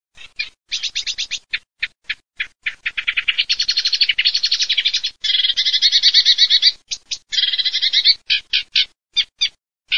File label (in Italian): cannaiola, birdsound